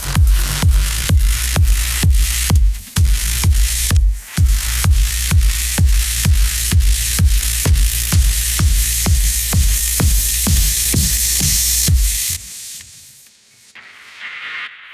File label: edm anthem